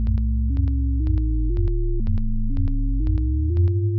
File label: bgm level1 loop